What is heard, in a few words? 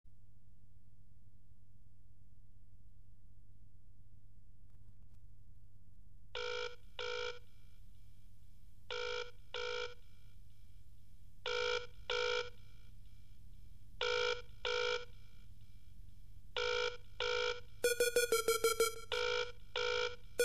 Bass Radio Drum and Bass Jungle; UK Bass Radio; DJ-PSYCHO-666-THE Well Hung Wikkid One SHOW